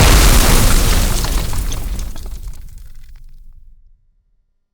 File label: Explosion hit wood; Hovl Studio; SFX Battle sounds; WhiteMan Unity2D